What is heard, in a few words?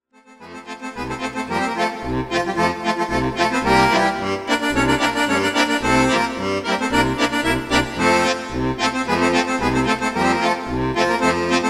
Polka; chalkillustrated; circus